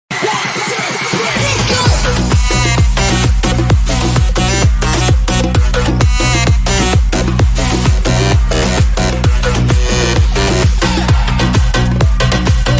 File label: instagram story music